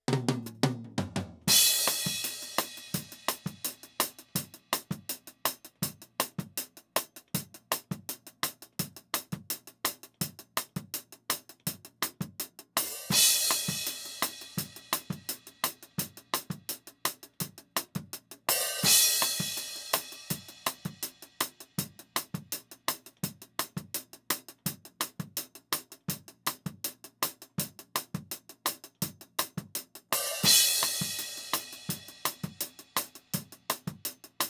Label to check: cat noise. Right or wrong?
Wrong